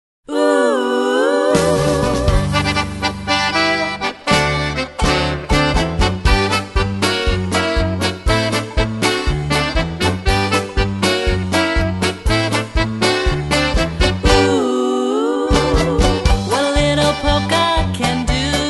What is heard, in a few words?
Polka